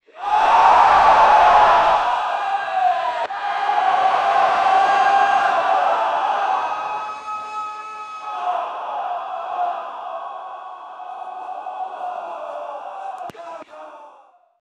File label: sound effect of roman battle, battle cry